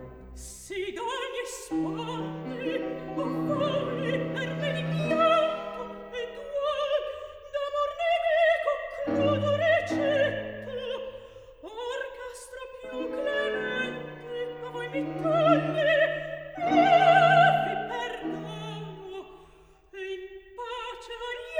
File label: opera, Recitativo